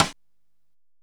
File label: drumcomputer, EMU SP 12; Retro Sampler; EMU SP 12+1200